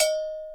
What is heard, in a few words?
Bells